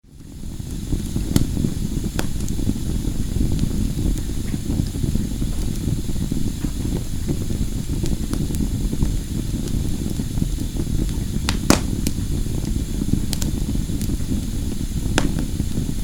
Fire Logs Burning in Grate, Flame with some spitting and po; Fireplaces; Tom Hutchings